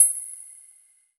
Tactics Perk Used

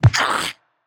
SFX Shield Minion Hit; Android; Portal Quest

